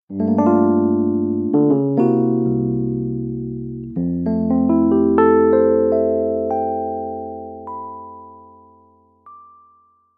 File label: short rest, jingle